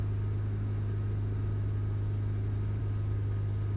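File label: engine low steady; Blind Drive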